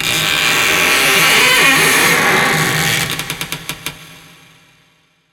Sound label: ghost house door, anime